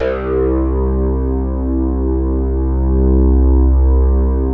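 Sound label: SOLID STATE, BASSES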